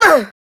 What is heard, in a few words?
Pain, Grineer Queens Guards